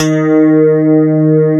CLAV, FUNK FM CLAV

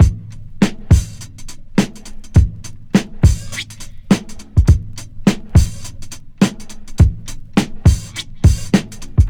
drums in transit, breakcore

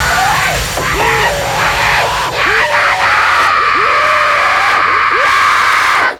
Kaos FX, Ambient Grooves

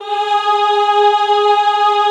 EHH G#3, Classical Choir; Best Service

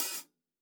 HH, HI HATS; Basement drums vol 2

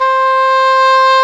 WND English Horn; Roland L-CDX-03 Disk 1

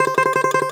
domra, Russian strings; E-MU Producer Series Vol. 7 – Old World Instruments

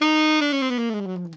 ALTO LNG FL D#3, Sax; Giga Samples Collection; ALTO STEREO

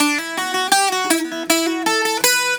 Celtic Flavours; USB Soundscan vol.42; AKAI

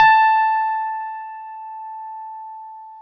CP-70 A4; E-MU Formula 4000 Series Vol. 5; Protozoa

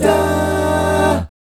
guy chords, vocal, jazz; ILIO; Vocal Planet VOL-3; Jazz & FX

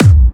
Clubby Kicks, Bassdrums

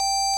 ORG VOX G, Continental; Roland LCDP09 Keys of the 60s and 70s 1

